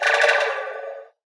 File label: damage; Barotrauma; Barotraumatic; Legacycrawler